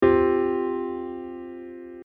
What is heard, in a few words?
Csus4